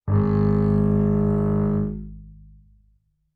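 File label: Bass arco fortissimo sul E Ab2, Double Bass; MIS Pitches - 2014; Strings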